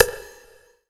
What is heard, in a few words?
One Shot Ambient Pop, Drum N Bass - Percussion; Sonic Foundry (Sony Creative Software); Knutrix Drum & Bass